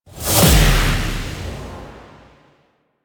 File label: Wall Push; Warframe; The New War; Finale